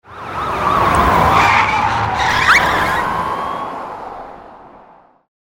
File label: police car no win; Beat the Bobbies 2